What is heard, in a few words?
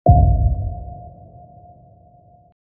sonar sound effect